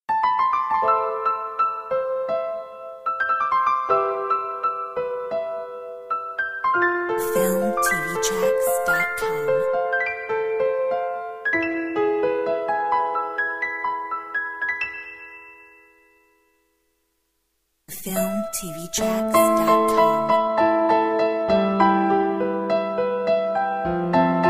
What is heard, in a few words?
unfinished story piano solo